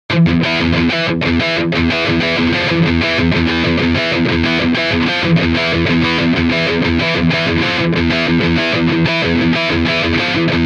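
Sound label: Rhythm, guitar; Mr. Tabs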